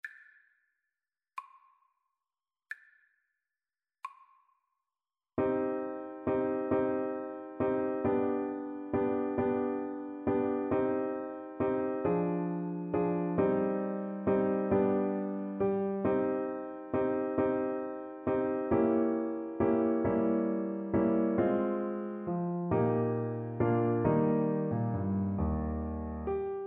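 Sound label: farrenc, alto saxophone; op5 no2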